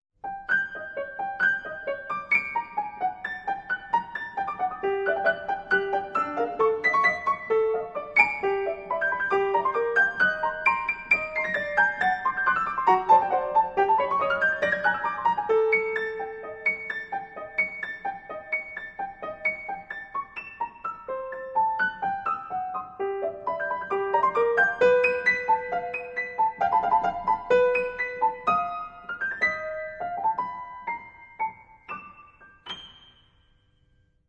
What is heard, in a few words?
Piano; Arca Noe Pulga